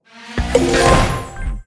pick n click; netent

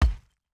reel bounce

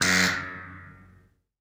Pick Strike; netent

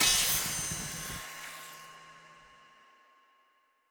count Up Loop End bigger; netent

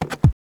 reel bounce; netent

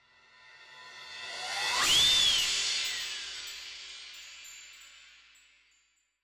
big Win Bang; netent

